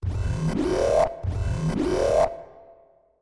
Global Alarm

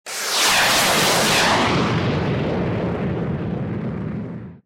Weapon Missle Pod

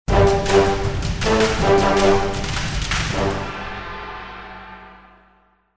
MUSIC Victory

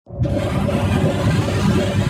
Global Transgate

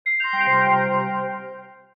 Transaction Palladium Added

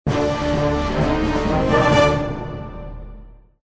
MUSIC Defeat

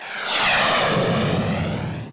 Ship Weapon Guided Plasma

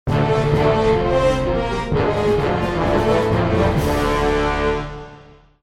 MUSIC Defeat

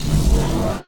Barrier Up

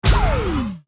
Base Weapon Drone Squadron

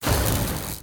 Base Misc Barrier Hit

